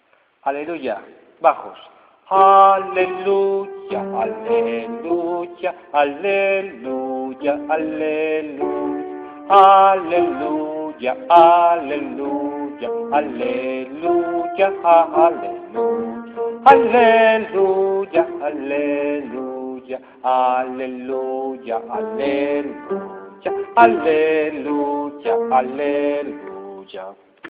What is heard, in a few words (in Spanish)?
bajos; aleluia